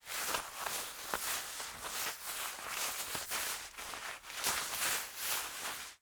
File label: Weather Snow Moving; AdobeCC